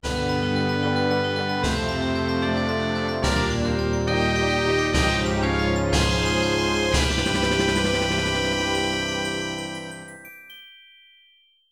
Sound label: Medal Get Fanfare; Zelda - Ocarina of Time